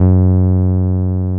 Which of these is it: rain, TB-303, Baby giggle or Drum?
TB-303